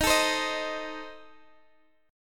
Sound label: C Minor 6th Eb, chord